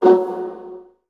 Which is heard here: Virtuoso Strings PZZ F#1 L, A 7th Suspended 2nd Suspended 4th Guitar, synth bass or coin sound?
Virtuoso Strings PZZ F#1 L